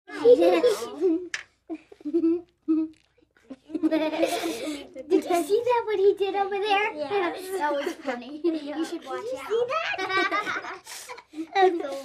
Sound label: 5 Yr Olds Giggle Chat